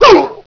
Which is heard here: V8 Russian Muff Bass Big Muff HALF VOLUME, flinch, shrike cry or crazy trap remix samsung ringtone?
flinch